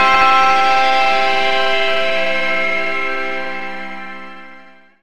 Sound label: stabs